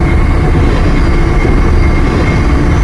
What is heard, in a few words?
drawbridge move, door; hl2; func door